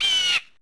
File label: bird; Tony Hawk's Project 8; XBOX